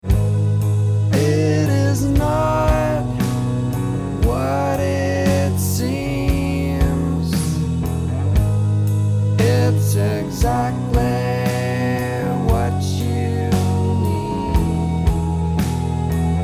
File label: bass crawl